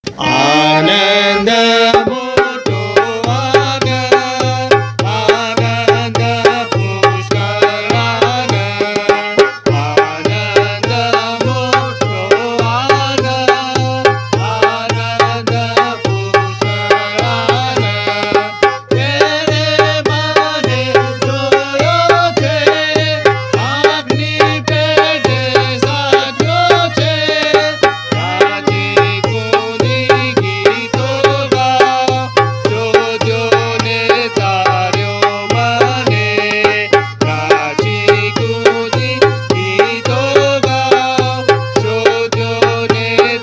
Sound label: Traditional Tune; 539 Anand Moto Anand